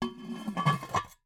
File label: Cookie Jar, ceramic, glazed, irregular shape, hollow base, hollow top, rough, resonant, tonal, close; medspeed; Eiravaein Works; Vaeyan IV